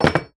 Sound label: crowbar drop